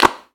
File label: raw clap sound clip f sharp key, Royality free clap one shot tuned to the F# note.; single hits one shots